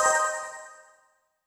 C4, Synth Leads

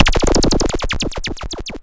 Cwejman S1 - Sloppy Zaps

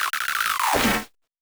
Perc Random

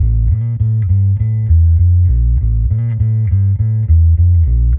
Bass Guit, 100bpm; Loops n Lines

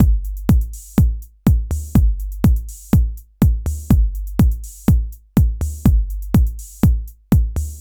Four On The Floor, Drums & Percussion, Electronic; Sonic Foundry (Sony Creative Software) - Electronic Point Blank

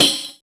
Mazhar Sak, Tambourines; Percussion

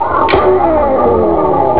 elec gt wail; jazz jackrabbit 2; M8

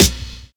DISCO SD, SNAR; USB Soundscan vol.11; AKAI

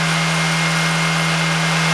constant, Engines; E-MU Producer Series Vol. 3 – Hollywood Sound Effects; Ambient Sounds

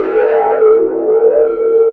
DR. LOOP, BUZZ; X-Static Goldmine 3

